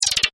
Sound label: infobox close; Fix-It Felix